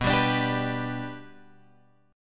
B-Minor Sharp 5th, Guitar, Drop D

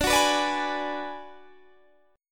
D 6th Flat 5th D down Guitar Standard, chord; D6b5 strummed; JGuitar

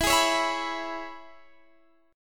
E 6th, down, Guitar, Standard; strummed; JGuitar

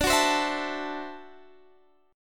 D 9th Guitar Standard, chord; strummed; JGuitar

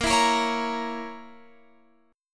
Bb Diminished Bb Guitar Open F; strummed; JGuitar

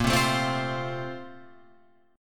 Bb-Major 7th Suspended 2nd-Bb-down-Guitar-Open F; BbM7sus2 strummed; JGuitar

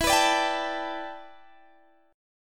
E Minor 11th guitar chord, DADGAD

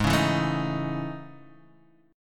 A Major Sharp 11th, Ab

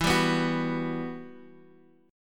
E Minor Add 9th; madd9:1,b3,(5),9

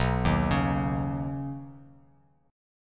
B Major Add 9th B Bass Standard; Badd9; arpeggiated; x,2,1,6

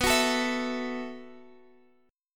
E Suspended 2nd Suspended 4th B

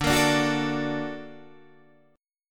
A sharp Augmented Major 7th

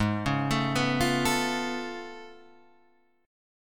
A Augmented Major 9th G sharp

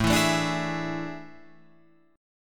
A Major 7th Suspended 4th, Guitar, Open F; AM7sus4; strummed

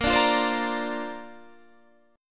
Bb Major Add 9th, Bb, down, Guitar, Standard; strummed; JGuitar

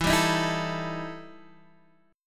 E Minor Major 7th Double Flat 5th, Guitar, Drop D; chord shape

